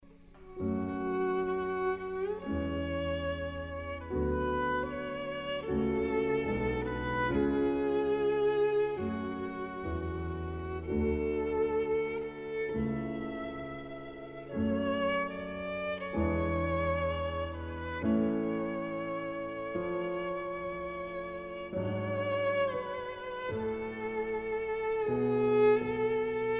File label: chant; halvorsen